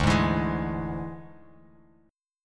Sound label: D Suspended 2nd Flat 5th D Bass Standard; strummed